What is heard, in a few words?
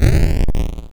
small explode; spelunky 1 1